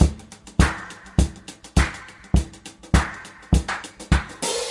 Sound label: claps a lot, Boombap, Drum loops